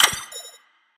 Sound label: Clock Tick Parry